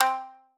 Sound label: marimba shamisen